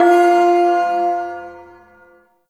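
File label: Ersatz Shawzin Octave One F; Music; Warframe; Duviri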